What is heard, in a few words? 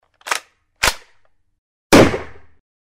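inserted magazine, chambering round, shot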